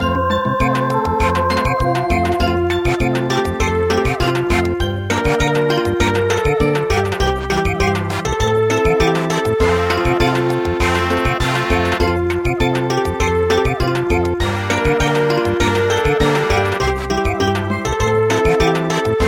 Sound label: Samba, traditional; midi